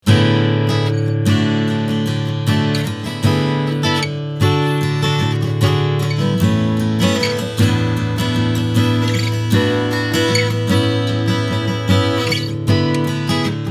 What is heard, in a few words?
strumming